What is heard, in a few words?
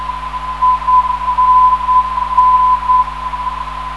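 non-directional beacon; INN; 420kHz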